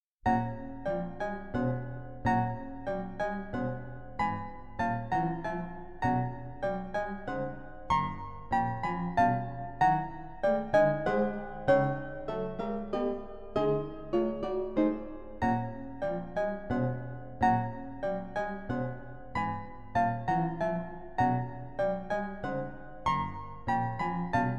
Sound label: Arpa